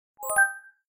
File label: Swivel open; Nokia; 7373 China